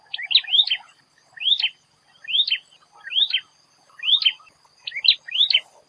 Ashy Tailorbird calls, Malaysia; Tambun Interior Perak Malaysia

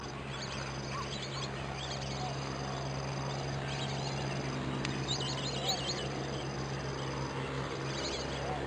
Lesser Whistling Duck Calls; Amar

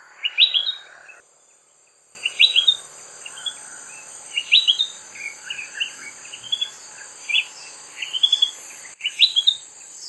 Spectacled Bulbul fruit calls; Perak Malaysia

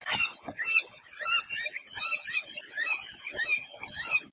Crimson Winged Yellownaped Woodpecker calls; Amar

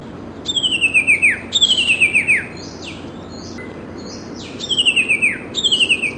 Iora song warning; Amar

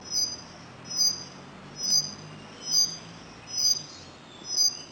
White tailed Robin adult call; Amar

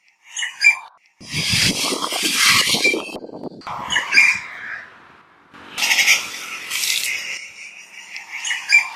Grey Treepie calls; Kenting National Park Hengchun Peninsula Pingtung County Taiwan

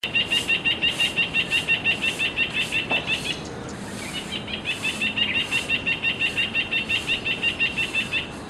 Pied Triller Lalage female calls composite; Amar

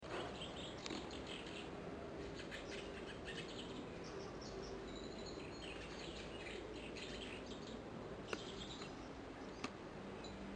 Brown Shrike warbling calls; Amar

